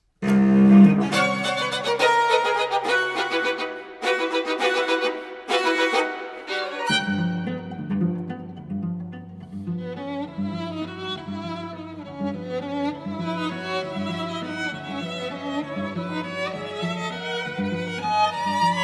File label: String Quartet; No. 2; II. Vivave, Scherzando